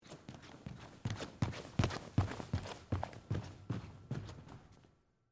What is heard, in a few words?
Stage Floor Run, Footsteps, Stage, Floor, Run, Across, Wood, Surface, Theatre; BlastWave